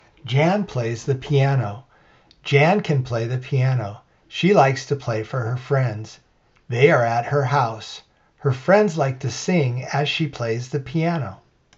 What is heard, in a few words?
piano